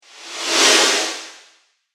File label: Brush Whoosh Strong; Whoosh Sound Effects; sound design